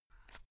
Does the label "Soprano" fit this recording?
No